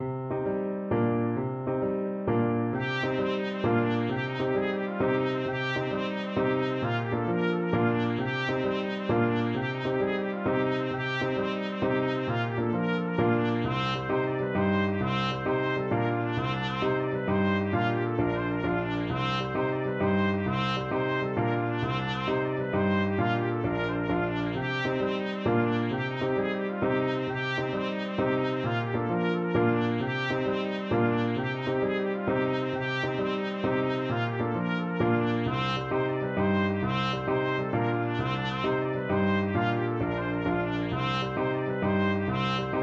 hills of ireland trumpet

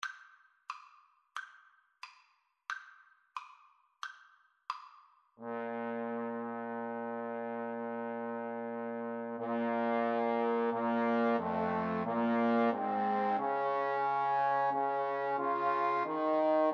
pavane trombone trio

